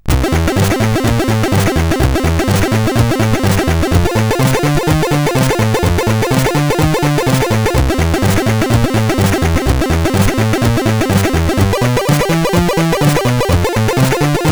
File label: Jazzy Tune, CSG8580R5; sid